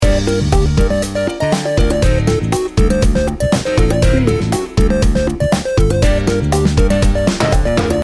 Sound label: sassy salsa loop